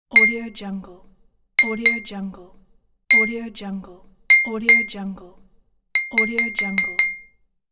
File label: glass bottle clink